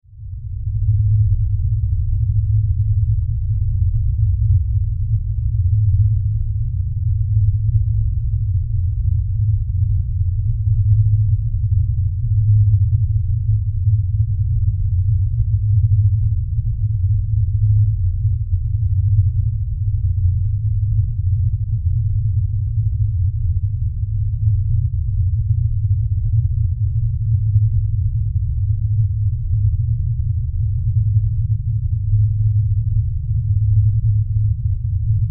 Horror Drone Demonic Low Rumble; Evil Sound Effects; Evil (Sound Effects:Horror)

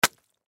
Wash Cloth Wet Throw Down Splat; Cleaning

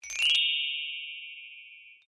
XYLOPHONE SMALL STRUM UP, Tiny toy xylophone; Mallets; Musical; Foundation4

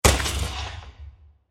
Bursting Wood With Scratches; Bursts; Impacts; Crafted Signals

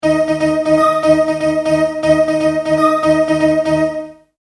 Multimedia Tone-Thinking/Processing

